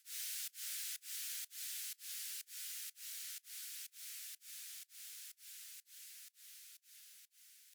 Noise G 124

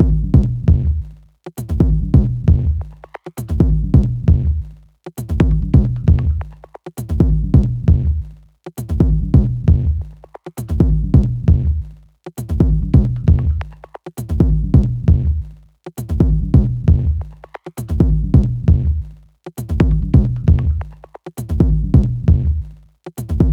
Bonus Beat; Locked Grooves

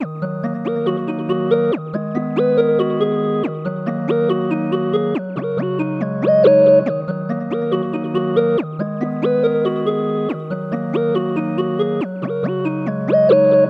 Melody Loop D min 140BPM; Walter

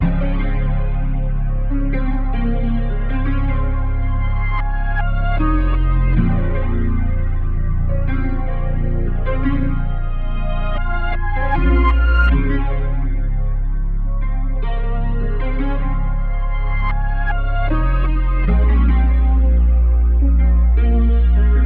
Cassette Fm 78BPM; Young Old

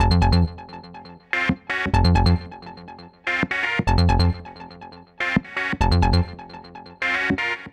124 Fm Synth

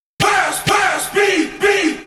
128 G min Vox Fx

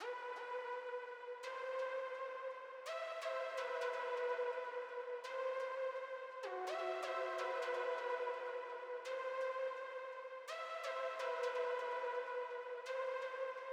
126bpm Lead

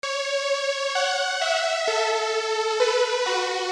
Trance; Industrialism

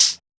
CHH one shot

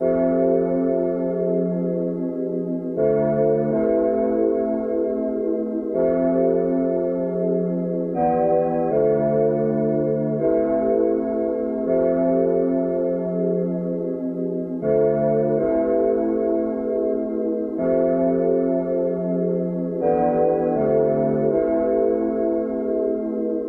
Chord, Tapedown Pad, 82bpm, C, Analog Vintage

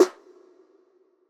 Snare

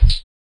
percusion flute

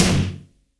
SNARE WITH ROOM PITCH DOWN

